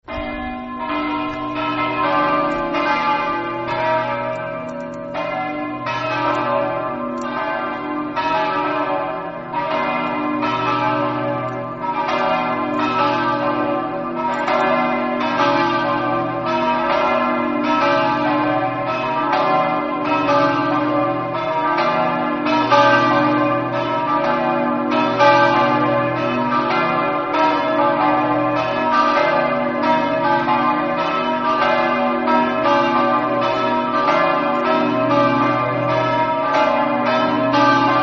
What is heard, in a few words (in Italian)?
campane